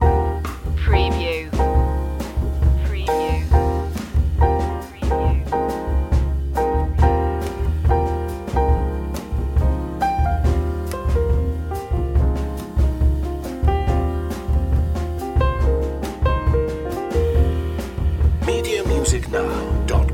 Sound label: a passing glance loop